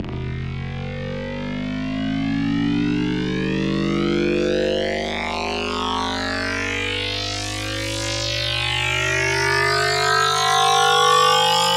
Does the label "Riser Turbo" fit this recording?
Yes